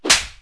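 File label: whip smack; impacts; Wired for Sound Pro CD; Aristosoft, Inc.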